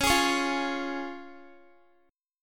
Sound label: Db Major Db Guitar; strummed; x,16,18,18,17,x